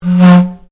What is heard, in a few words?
Ships Horn; Boat; Sounds onboard HMAS Castlemaine; Vehicles